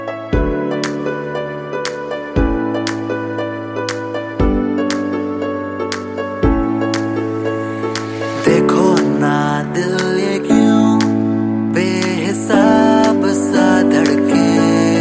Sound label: music ringtone bollywood ringtones